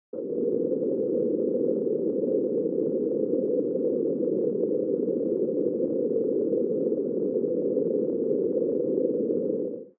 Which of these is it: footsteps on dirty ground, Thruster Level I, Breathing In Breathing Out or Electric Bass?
Thruster Level I